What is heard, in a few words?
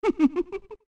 Laugh; Mask Salesman